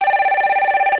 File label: Bell; Audiovox; CDM-8940